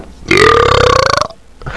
Neanderthal Maiting Call; Down and Deep

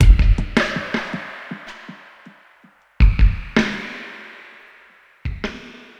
DRUM LOOP ambient 160

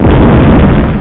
bomb; Sim Cinema Deluxe v2.5 PPC

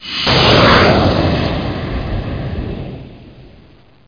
missile; fleet

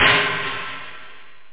Open Hat; 808+909

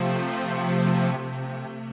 SYNTH PAD HOUSE 125 A; eJay; Sphere

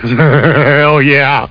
laugh

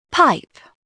PIPE; Dorling Kindersley My First Incredible Amazing Dictionary

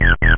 acid

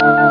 marble

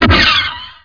blaster; MOTS

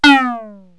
met drip, cartoon; Wired for Sound Pro CD; Aristosoft, Inc.

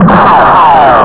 blaster

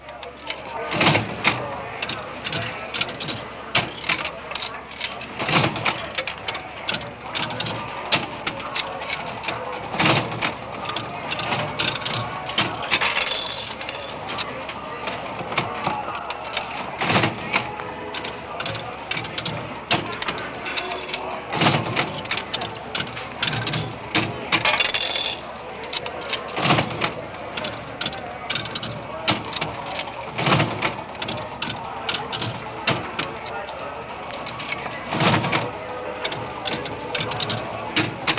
casino; parks

